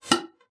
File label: BASSOON; Xsample VOL-6